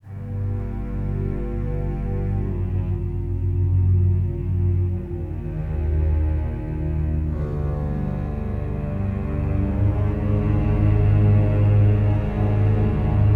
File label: linear Ominous